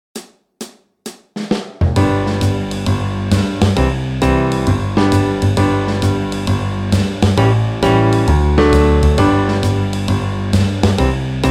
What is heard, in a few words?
Jazz